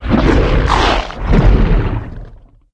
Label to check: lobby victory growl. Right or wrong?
Right